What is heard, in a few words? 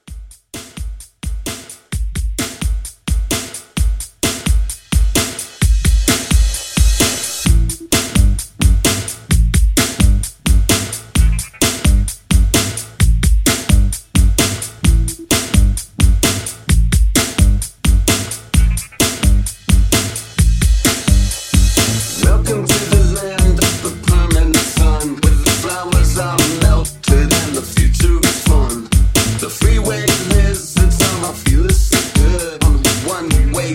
Funk Rock Re Drum 130